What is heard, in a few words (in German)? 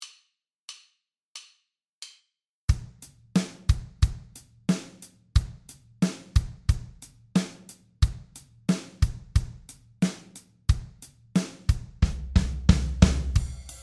Rhythmus Tempo 90